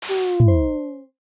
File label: chapter close seal